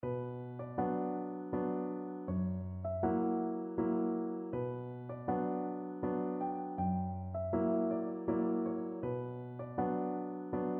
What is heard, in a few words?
schubert waltz, piano